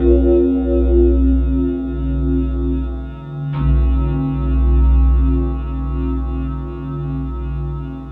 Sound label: Tundra, Pads; Sonic Foundry (Sony Creative Software); Synchro Funk